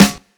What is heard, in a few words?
huge steel snare drum sample g key; snare drum samples